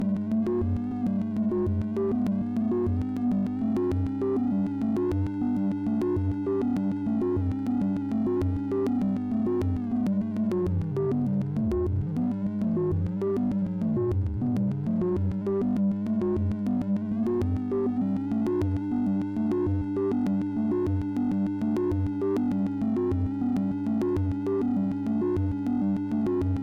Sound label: Space Noodle Synth